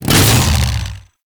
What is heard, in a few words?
Saw Melee Swing, Weapons Melee; Warframe; Grn Ghoul Saw Player